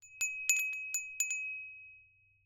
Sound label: windbell japanese porcelain clapper paper windcatcher cotton rope gust strong; Sonniss GDC